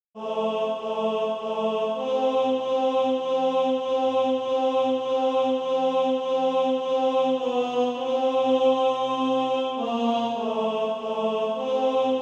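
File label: tenor, SATB Practise Music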